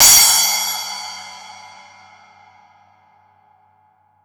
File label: Zildjian cym 12